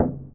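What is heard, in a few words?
button digital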